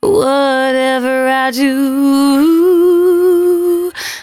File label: 113bpm C#, Velvet Vocal; Zero-G